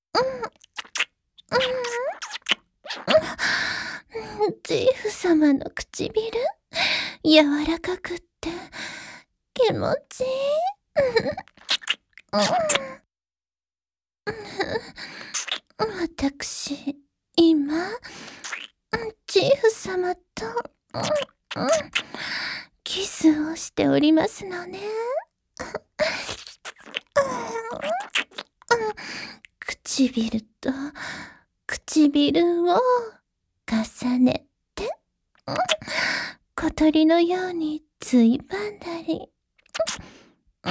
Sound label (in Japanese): ディープキス